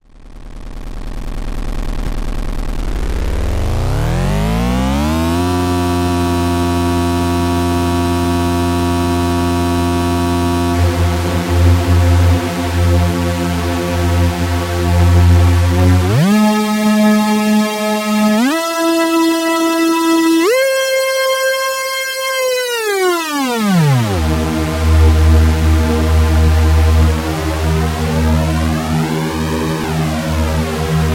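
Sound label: square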